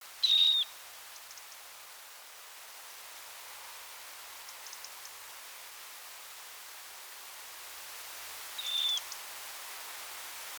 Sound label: Dunlin Calidris alpina